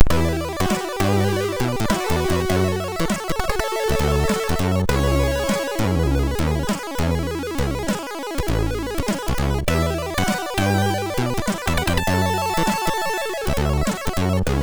Jazzloor sid MOS6581R2

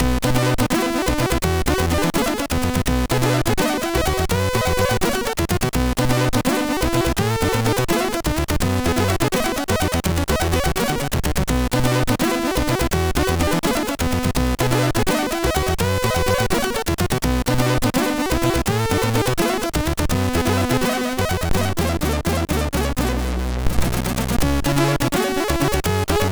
Shoort Jazz, sid MOS6581R3

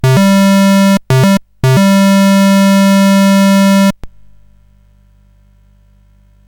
Dragonworld sid CSG8580R5

